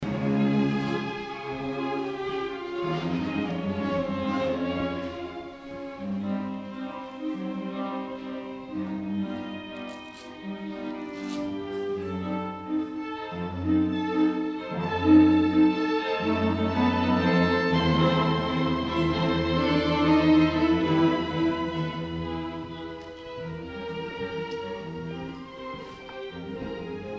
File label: violins